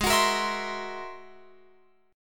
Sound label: Ab Augmented 9th Ab Guitar Open F; strummed; x,15,18,17,19,19; Ab+9; JGuitar